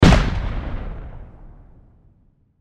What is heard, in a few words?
Cannon Fire Single Shot Pirate Blast Explosion; Gun Shot; Warfare; Gun Shots